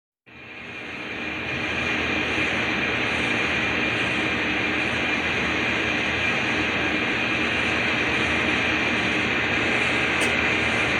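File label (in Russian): conveyor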